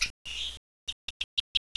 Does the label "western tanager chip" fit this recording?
Yes